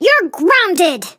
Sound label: flea hurt